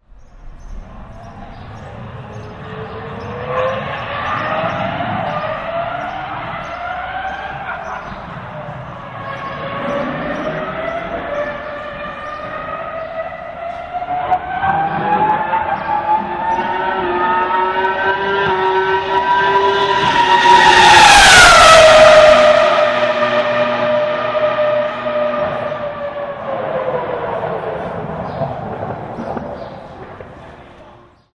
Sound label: Bmw Williams F1